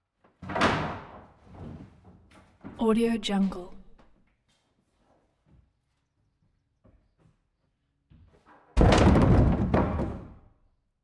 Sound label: dragg and drop heavy wood rubble